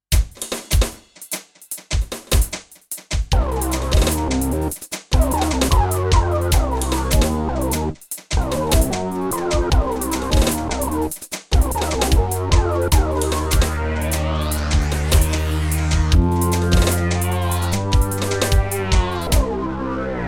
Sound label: RM50 vs Prophet Rev2; Powerd by MPCX